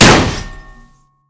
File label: shield heavy block big